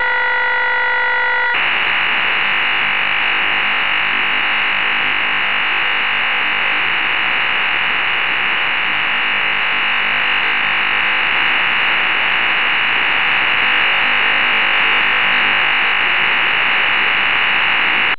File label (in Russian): zapisi signala modema ard9800 ofdm-36